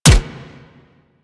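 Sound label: machine gun shot; Weapons; Archwing Mach Gun B; Warframe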